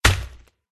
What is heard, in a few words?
berserk attack contact; zarlog